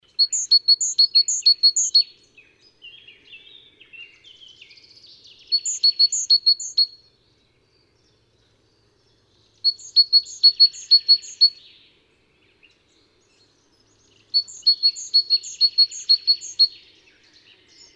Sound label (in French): Mesange charbonniere chants